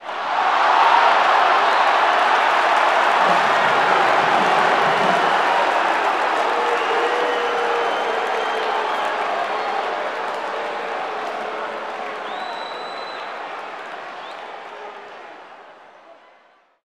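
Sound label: Basketball Crowd Reaction; SFX Kit; Crowds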